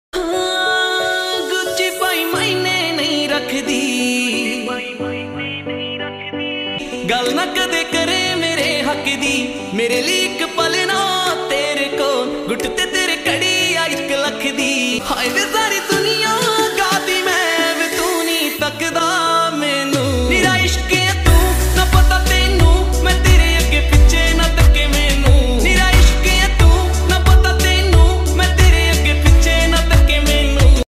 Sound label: panjabi ringtone